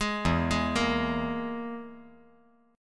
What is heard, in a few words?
E Major Flat 5th